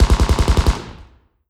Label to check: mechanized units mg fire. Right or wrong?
Right